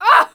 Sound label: Archer Hit Vox; Civ4 warlords